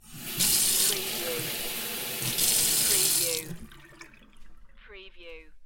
Kitchen sink, turn on water, turn off, water flows down drain